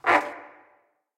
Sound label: Wheel Rotat Creak Start